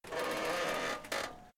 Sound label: Elevator Creak